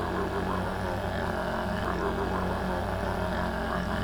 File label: engine